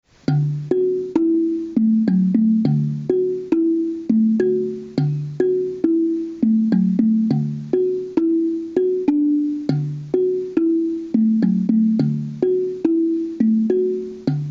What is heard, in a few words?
drum thing